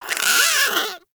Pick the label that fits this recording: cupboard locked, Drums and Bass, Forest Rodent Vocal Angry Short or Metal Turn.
Forest Rodent Vocal Angry Short